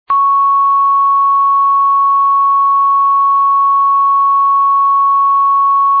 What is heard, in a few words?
C sharp 3, recorders; tuning